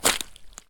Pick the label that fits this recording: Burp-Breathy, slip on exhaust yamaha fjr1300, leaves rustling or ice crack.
ice crack